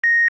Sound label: Sci Fi Beep; 1001 Sound Effects